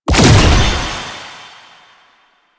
Research Pod Regenerate; JadeShadows; Ascension

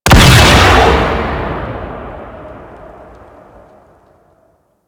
Gas Turret Fire; Ambience; Props; Warframe; Corpus Gas City

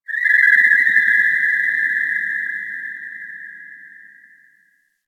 Ocean Underwater Creatures Small; Ambience; Warframe; Grineer Ocean

